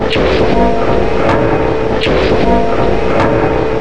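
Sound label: worker factory ambience; hl2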